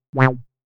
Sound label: Wah, synthlead